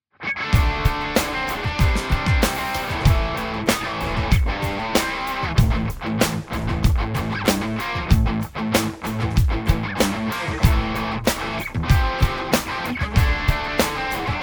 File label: Overdrive